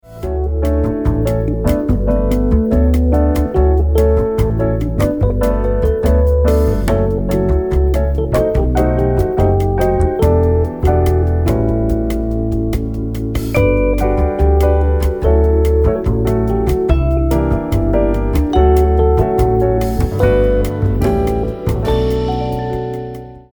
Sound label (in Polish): bossa podklad z mel; nuty